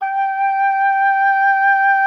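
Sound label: G5, oboe d'amore; Xsample VOL-7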